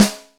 strong bottom end snare drum sound g sharp key, snare sound tuned to the G# note